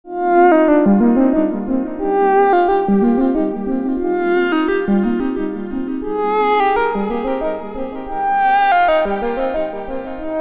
lead

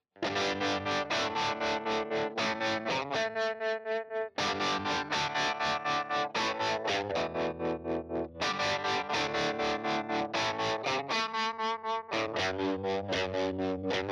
Ruby 63 Vib Silver Boost Vib

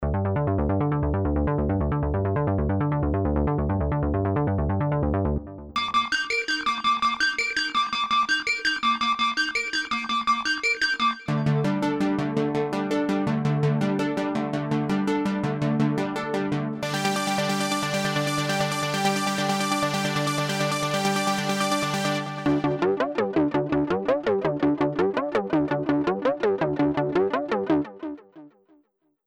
retro seq

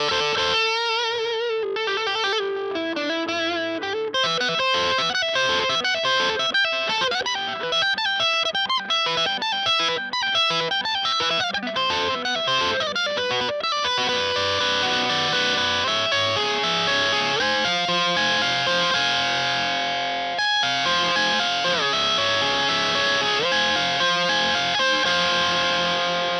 Epiphone SG Bridge

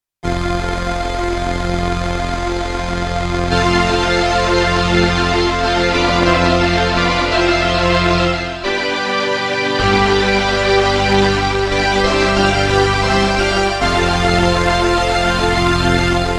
STREICHFETT

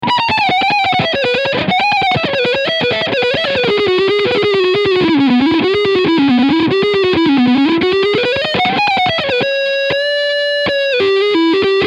Fusion II HH Leadsound Front Humbucker

